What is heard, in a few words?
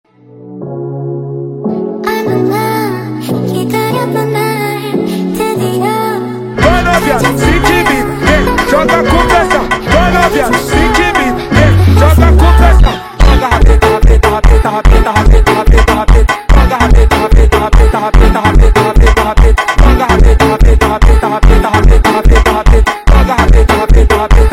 FUNK Speed Up